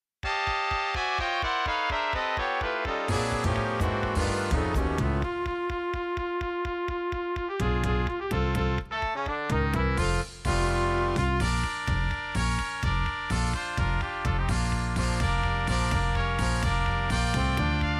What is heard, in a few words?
march fanfare